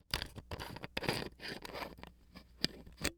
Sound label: Household Bottle Open Water Bottle